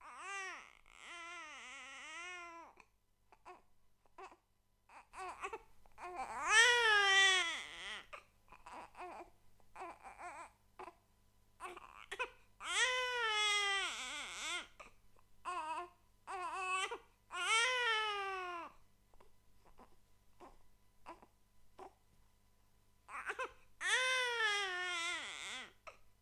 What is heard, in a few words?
Mild Crying